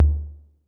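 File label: 808 low tom; Crypton - DrumBase5000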